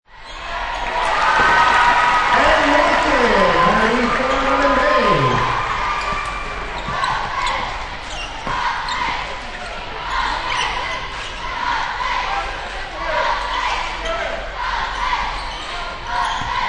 Basketball Cheerleaders Chanting With Announcer Speaking; Indoor sports: Basketball game